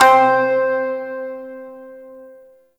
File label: Shawzin Oct Two C; Warframe